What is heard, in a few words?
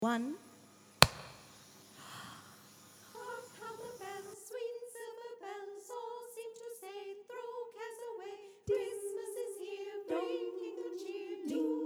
tenor bass carol of the bells